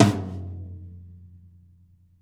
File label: high floor tom drum sound g key; tuned drums g key